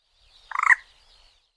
poule d'eau

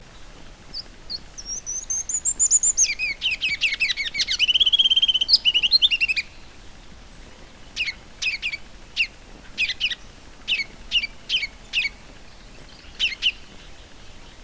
roitelet a couronne rubis

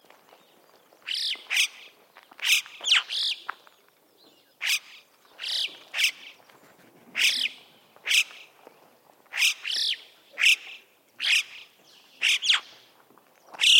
chant chocard bec jaune

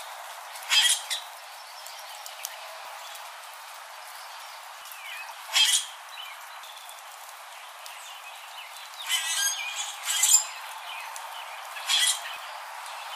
quiscale bronze

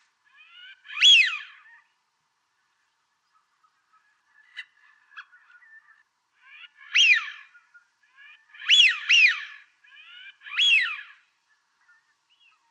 canard siffleur anas penelope

